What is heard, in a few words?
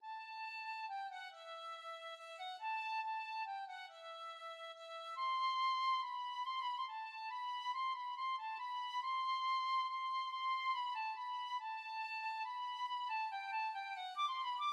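penny whistle, tin whistle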